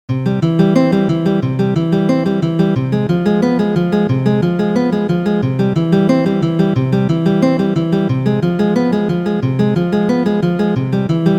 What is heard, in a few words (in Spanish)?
preludios; Ferdinando Carulli